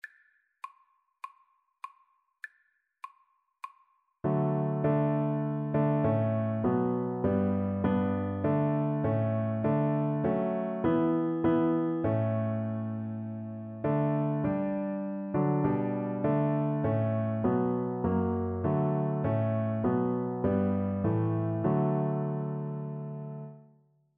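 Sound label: viola karaoke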